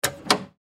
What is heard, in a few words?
Ship Light Box Open